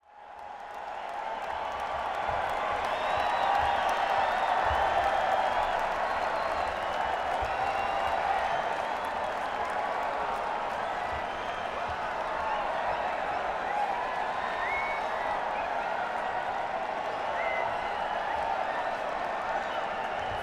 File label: Crowd, Madison Square Garden; New York, NY